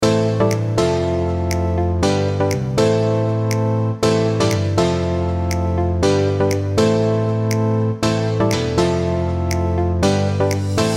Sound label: Funk